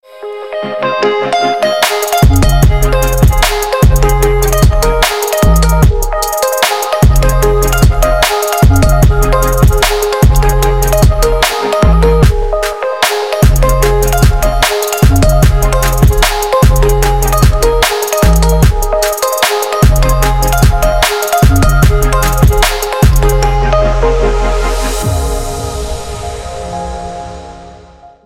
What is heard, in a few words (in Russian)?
khoroshaja melodija na budilnik